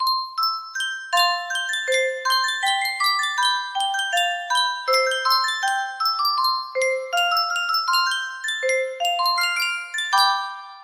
Sankyo music box, music box melody

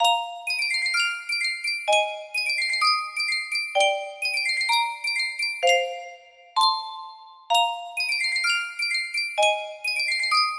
sankyo custom tune music box

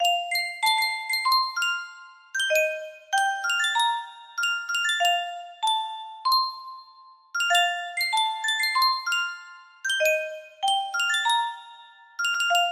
sankyo custom tune music box, music box melody

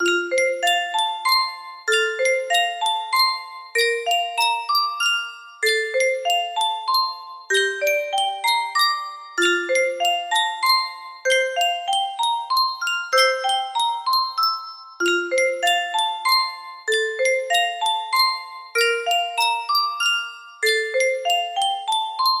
23 note music box; sankyo

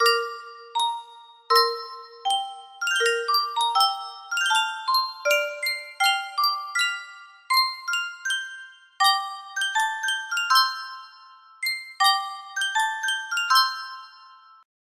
Sankyo Music Box, music box melody; back to the future

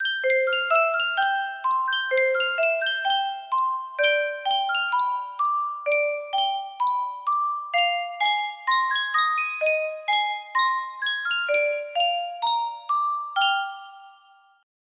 yunsheng custom tune music box

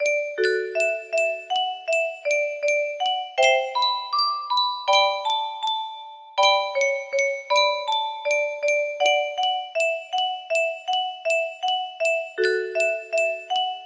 slow sad, music box melody

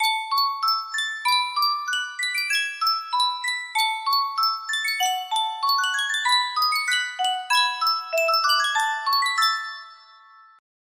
sankyo miniature music box

